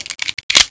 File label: clip in, beretta